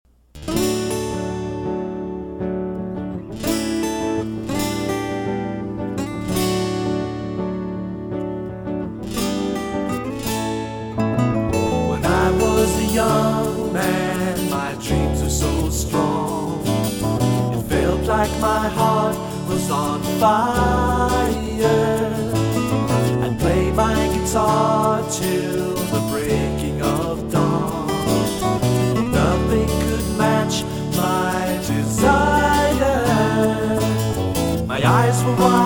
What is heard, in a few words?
Original Vocal Guitar